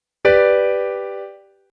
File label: G Major Triad